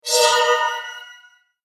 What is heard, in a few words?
Aura blessing